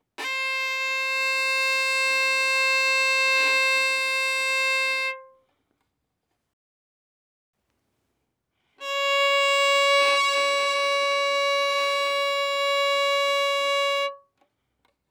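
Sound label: Violin arco fortissimo on A string C5 Db6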